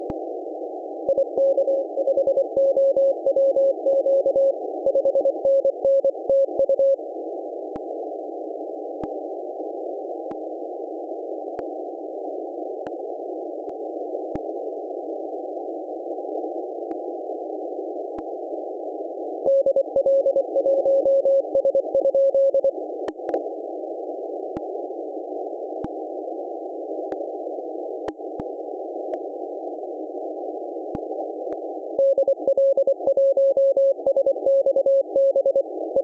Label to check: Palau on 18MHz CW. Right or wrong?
Right